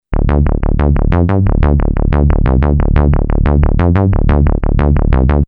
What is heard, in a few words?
bass